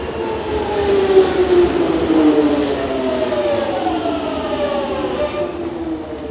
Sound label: london tube noise